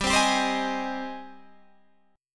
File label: Ab Major 7th, chord